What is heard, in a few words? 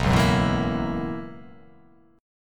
B Major 7th Suspended 2nd Suspended 4th B